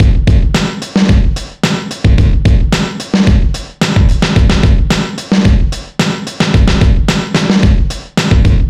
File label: Drum Break, Trip-Hop Loops; Sonic Foundry (Sony Creative Software) - Rondo Brothers Trip-Hop Loops & Samples; Apologists